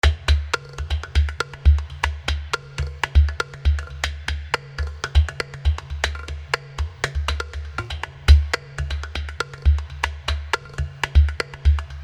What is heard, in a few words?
Euclid Urdu percussion